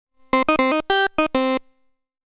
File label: Percussive Organ